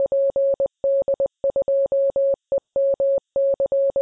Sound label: morse